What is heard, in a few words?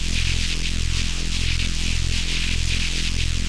guillotine idle, weapons